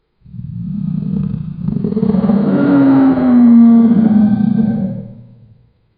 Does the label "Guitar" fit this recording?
No